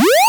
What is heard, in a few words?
jump, digital; 0.3s